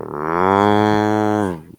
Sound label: cow melee; svencoop; cow tycoon; gonome replace